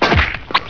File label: GUN; DOOMWADS